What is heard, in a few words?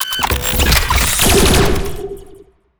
archeology event trap laser; Stellaris; ancient relics